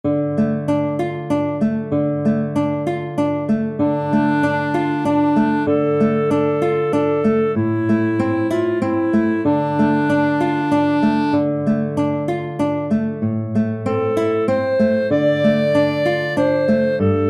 Scarborough Fair Clarinet

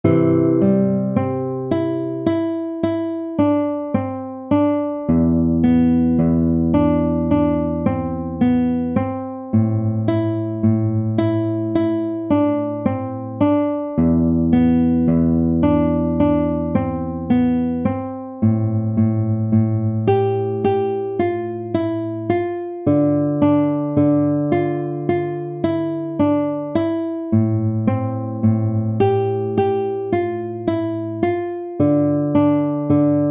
duet guitar I; prelude 4

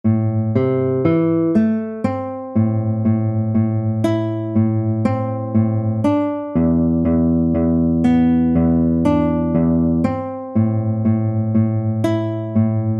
prelude 3 duet for guitar

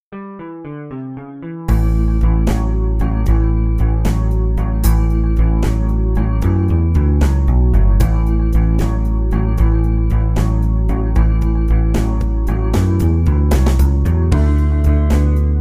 12 Bar Blues slow in A; Chord Progressions

